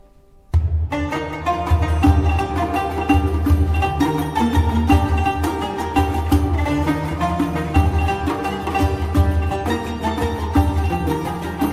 Ney

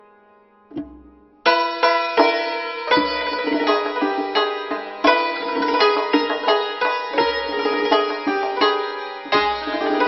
Santur Tonbak

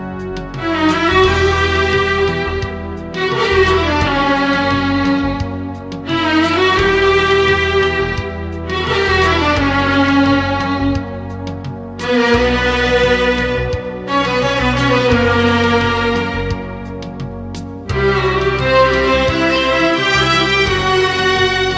Accordion

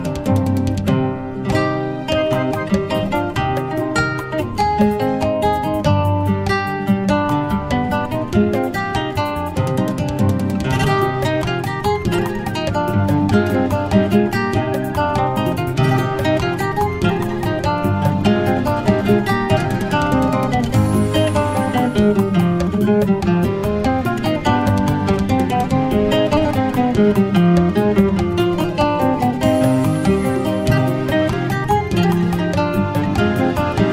Guitar

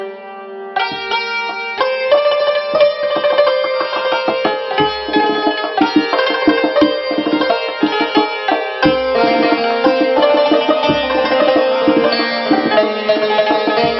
Santur Tonbak

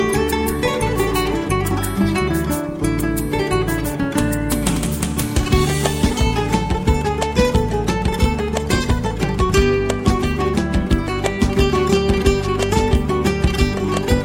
Guitar

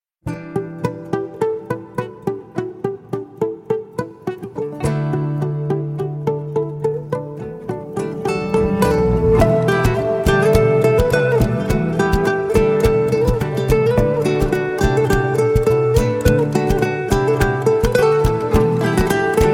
Acoustic Version

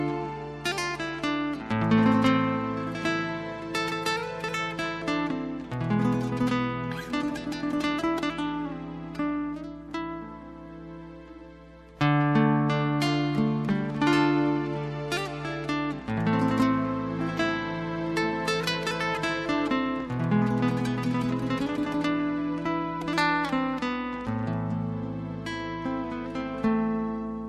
Guitar

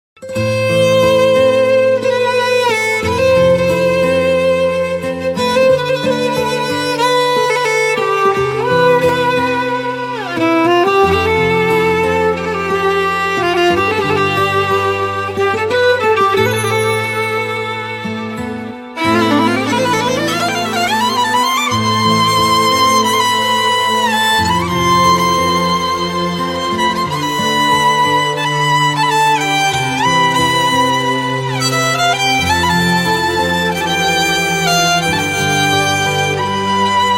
Violin

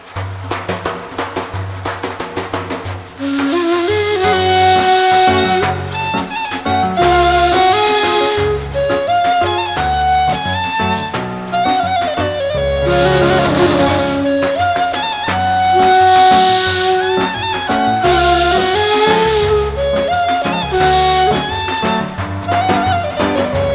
Ney, play back

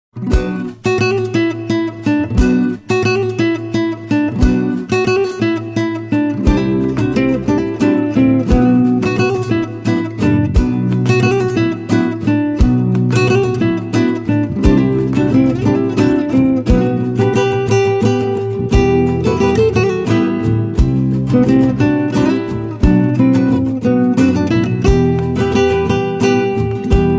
Guitar

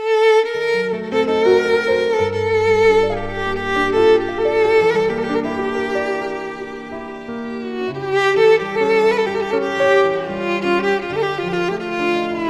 Violin

